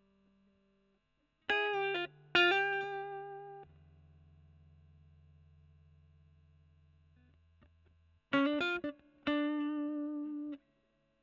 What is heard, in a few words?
E. GTR Gefell OD